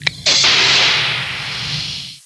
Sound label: Lock door